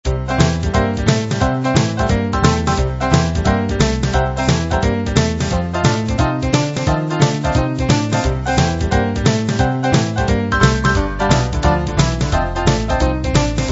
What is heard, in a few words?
boogie